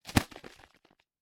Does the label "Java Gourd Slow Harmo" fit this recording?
No